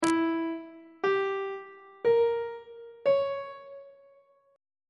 e sharp harmonic minor 7th chord 7 is d double sharp diminished 7th on treble clef